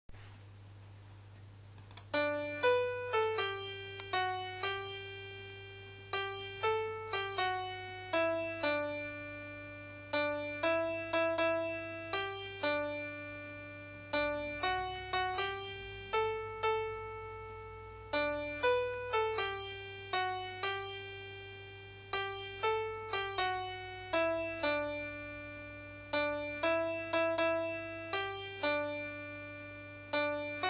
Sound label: Instrumental